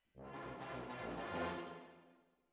Hall

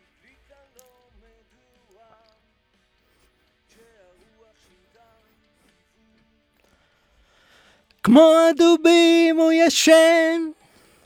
VOC

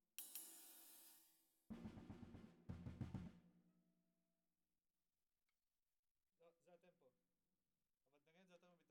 SNR

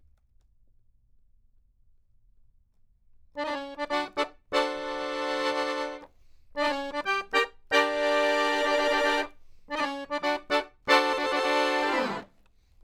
Accordion

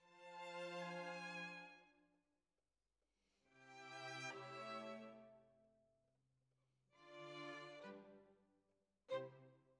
Violins, jazz for piano

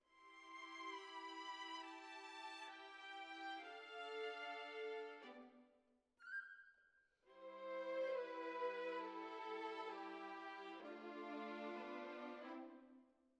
Violins Over

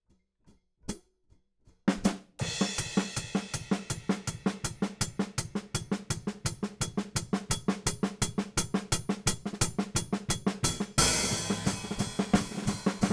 Hat

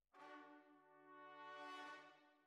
Tuba, jazz for piano

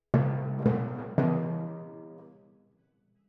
Twin F Timp

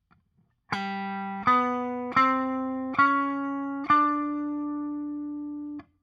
Guit Solo Back

